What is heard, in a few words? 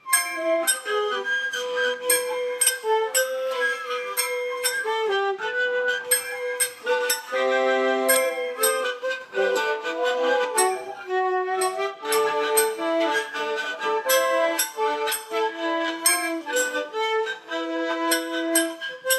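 cheerful folk fantasy music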